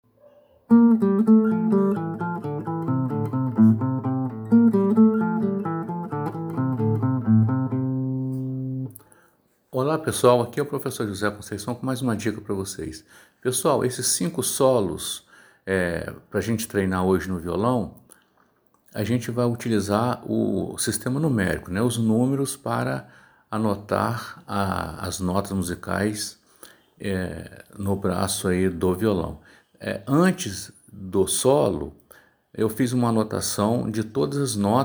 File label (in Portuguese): solos musicais para violão; 5 solos musicais para violão